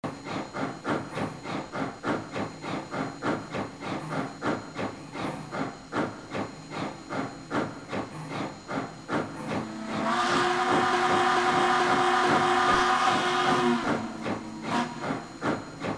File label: steam sounds; Railware